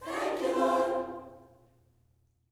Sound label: THANK U, Vocal Gospel